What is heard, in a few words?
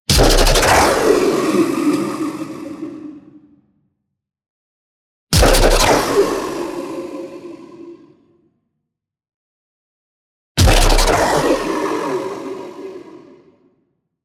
EXPLOSION LONG Clean Breath Descending; boom library; Anime Essentials